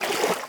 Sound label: swim step; Golden Crayon Sounds